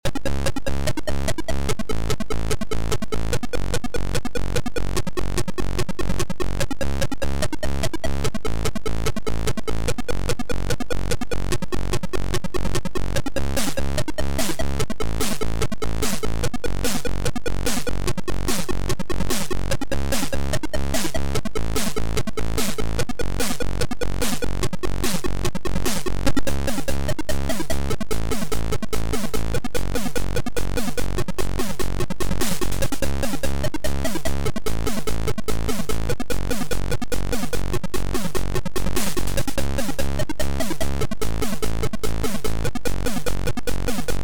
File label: TECHNO